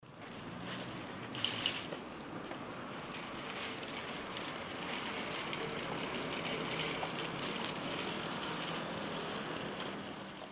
shopping cart and traffic

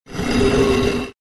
stone large drag on stone short

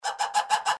lemur

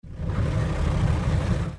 boat Motor sound effect

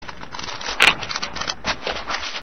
book flip

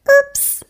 oops pitch

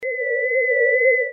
UFO Landing Sound

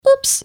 oops cartoon

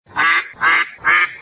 duck sound effect

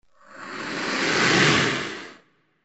plesiosaurs

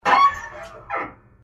open door squeaking

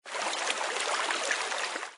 river cut slow

